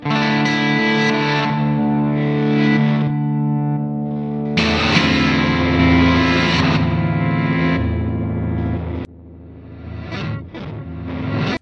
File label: A sus 4th thing, Guitar Noizes